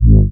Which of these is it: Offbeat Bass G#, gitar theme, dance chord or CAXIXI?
Offbeat Bass G#